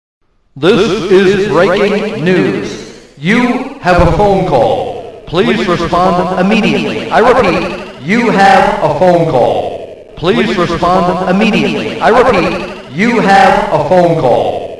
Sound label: Breaking News